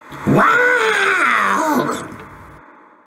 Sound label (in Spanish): grito; karthus